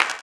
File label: CLAP, blues & soul; ILIO; Vocal Planet